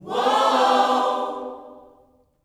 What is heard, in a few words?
WHOA OHS, Gospel; Spectrasonics; Vocal Planet Vol.1 Gospel